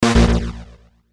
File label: Synth Stab Dark; Stabs; Sound Design; Multimedia; Blastwave